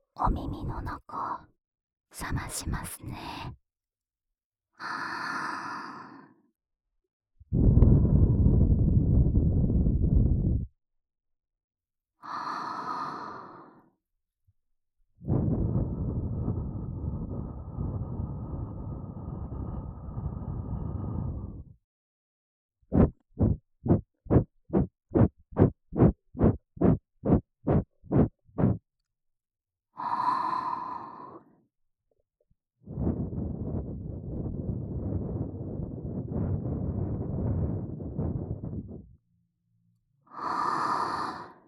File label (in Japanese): 【右】耳ふー, ASMR